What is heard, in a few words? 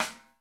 FUSION KIT; Northstar - New Gold VOL-1